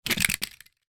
toy car die cast roll crash; sound effects one

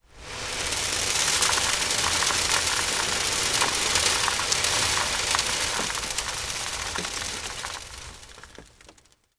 foley sand pour on metal; martinimeniscus

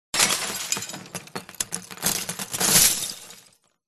crashes glass; audio mangler

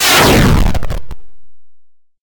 multimedia retro game rocket launch

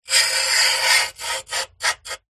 metal scrape sharp

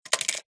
leisure toy plastic figure down on wood surface

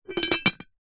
household teapot ceramic lid place on; zapsplat

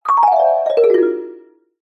cartoon descend mallet orchestral; zapsplat

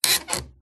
Stress Creak Squeak Groan; PMSFX

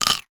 human bite cucumber

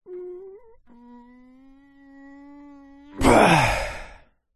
human male breathe out exhale from holding breath; zapsplat

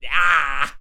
Human Evil; little robot sound factory

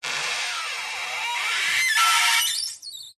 Wood Stress Creak Squeaks; DPA4060; pmsfx

